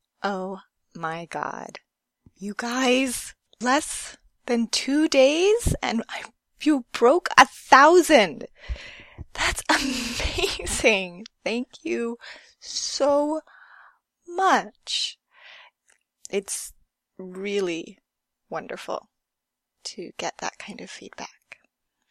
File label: gasps, moans, sighs, squeaks; F4A